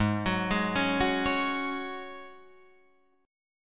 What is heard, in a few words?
G sharp 7th Suspended 4th G sharp, Guitar Drop D